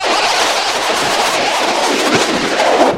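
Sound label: synthesizer effect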